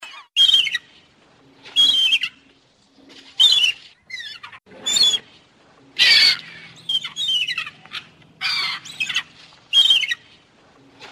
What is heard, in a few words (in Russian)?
chajka burogolovaja